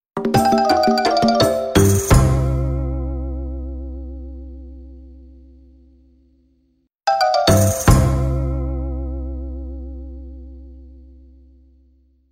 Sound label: JINGLE MARIMBA